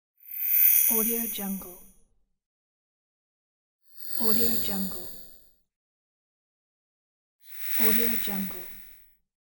activation laser

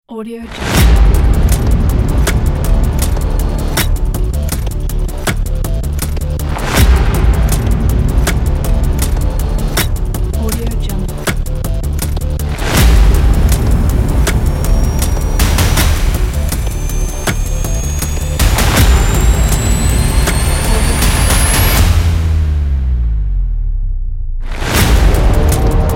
urgency countdown trailer teaser

